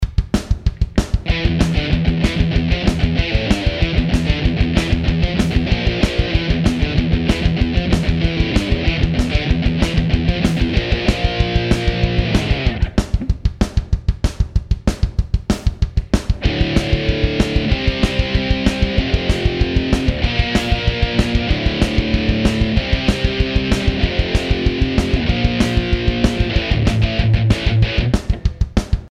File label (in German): Rectifier Vamp 12 Uhr